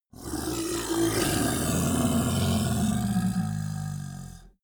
monster dark growl bubbling; kreaturen

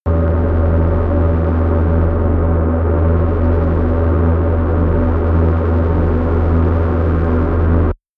spaceship flying; raumschiff

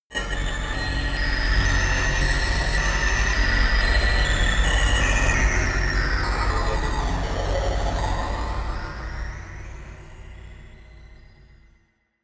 danger rumble, horror

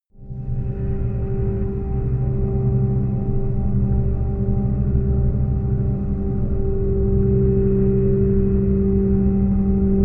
drone dark horror

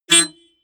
notification honk, autos; verkehr transport

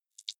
click noise, signale

